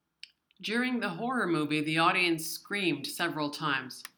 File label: horror; gritar